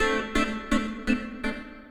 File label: SYNTH HOUSE 125 A, Keys; eJay